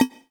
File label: Percs, Elektron MachineDrum; SPS1 MKII